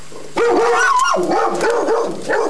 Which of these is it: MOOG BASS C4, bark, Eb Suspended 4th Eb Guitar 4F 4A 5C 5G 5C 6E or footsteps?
bark